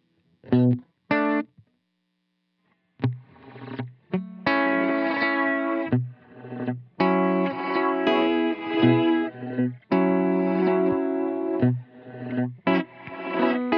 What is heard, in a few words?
Reverse Spring